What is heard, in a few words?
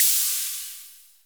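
SDS HH OPN, hi-hat open; Best Service; Real Mega Drums VOL-1; SDS V KIT 2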